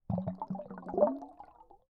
underwater movement; prosoundfx

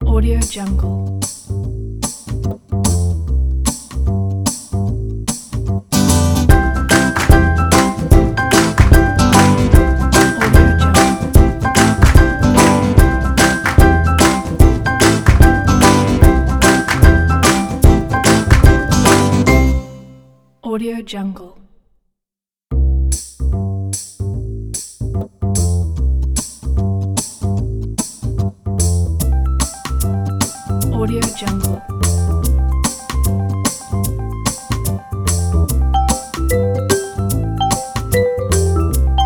happy upbeat

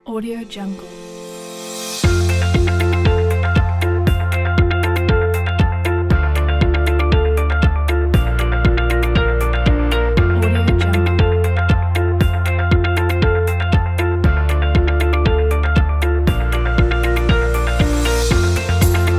inspired motivational corporate pack

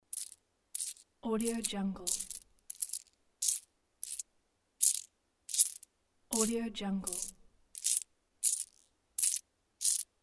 coins counted in hands

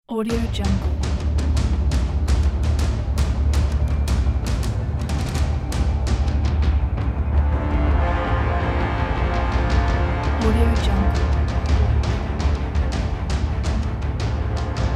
epic orchestral action

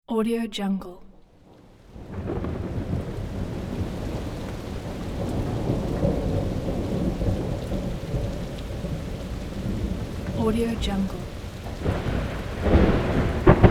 thunder roll with rain

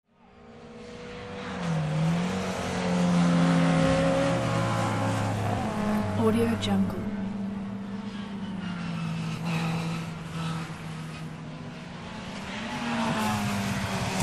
several race cars pass by fast

